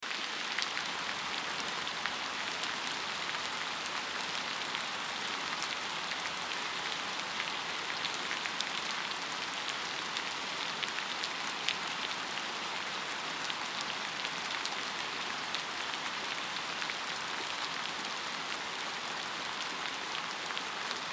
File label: Rain Record